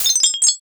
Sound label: glass break